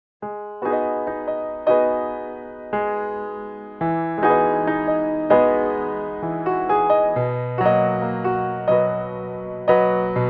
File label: solo piano